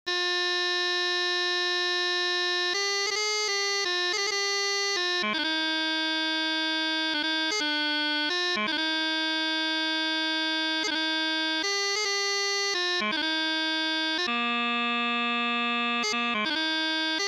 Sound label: Chanter